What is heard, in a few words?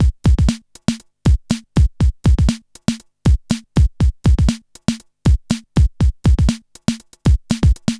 Drum loops, Electro